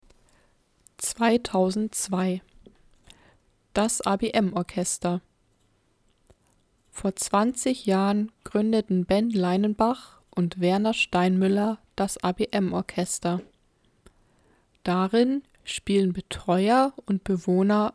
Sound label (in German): Orchester